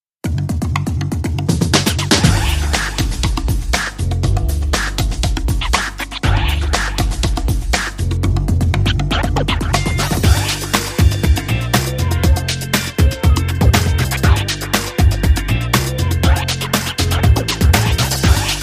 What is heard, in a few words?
Scratch Hammer, Rhythm